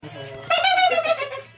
ehehehe

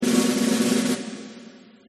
drumroll

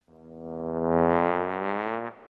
trombone; ren stimpy

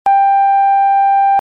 beep

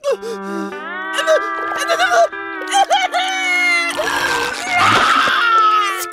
fish crying; le big switch

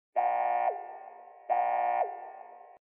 sirene; fallout

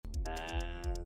ehhhhh

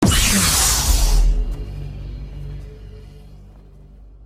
saber ignition

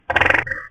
small toot heeheeh

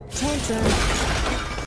tantrum; absolute solver

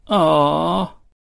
sympathetic awww

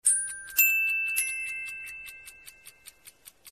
clock alarm sound

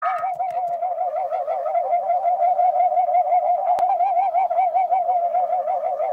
cartoon hypnotize

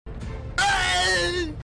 augh; sonic

